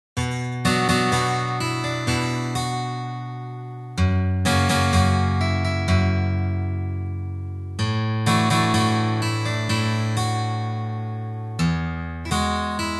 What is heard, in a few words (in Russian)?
na gitare